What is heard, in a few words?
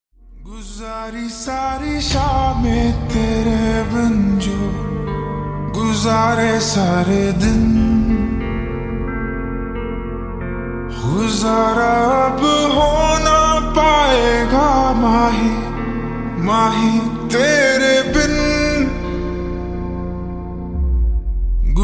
Slowed Reverb Ringtone